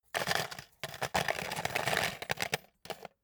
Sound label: paperclips drop paper box